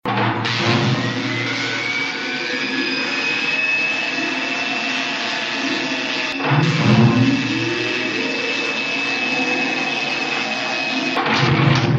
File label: lab sounds; before its alive